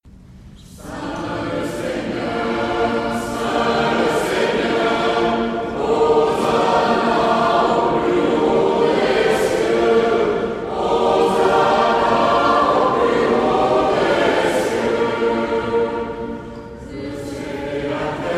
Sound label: Sanctus Tutti